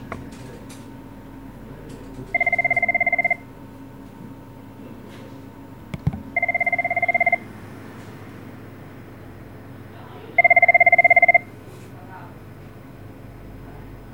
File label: Bank Phone